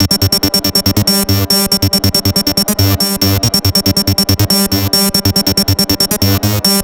Synth Arp

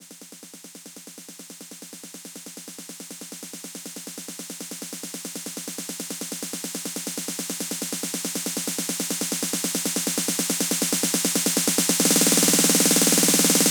Drum Techno Fill In

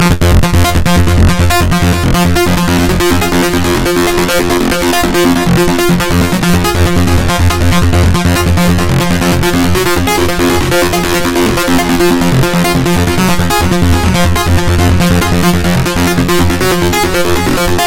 Synthe Bass Saw

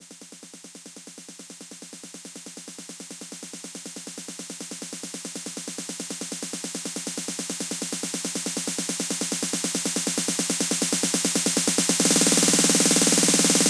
Drum Techno Fill In

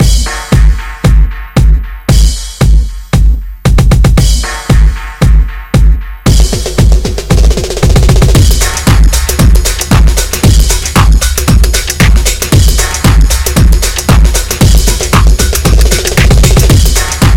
techno drums

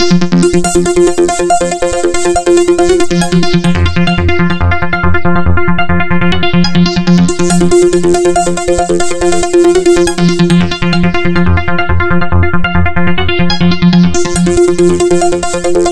Synthe Arp

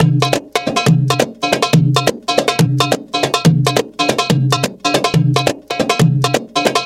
Drum Percu Break

